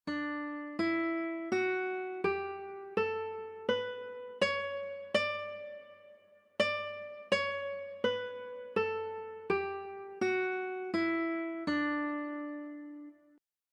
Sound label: Western, ragas scales